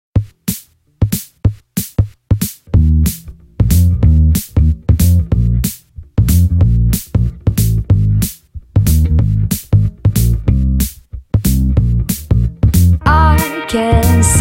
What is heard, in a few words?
Samsung Galaxy Note